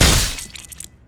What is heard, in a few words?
dragonstrike; LoL; jarvaniv